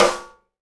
SNARE RIM, Live Snares; E-MU Producer Series Vol. 8 – Platinum Phatt (CD 1)

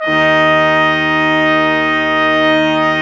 BRS QUINT, Quintet long; Roland L-CD702

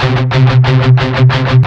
16TH C#3 R, Guitars; Spectrasonics; Hans Zimmer Guitars VOL-1; MED 16TH

